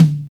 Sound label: dry tom sound d key, Royality free tome drum sample tuned to the D note. Loudest frequency: 279Hz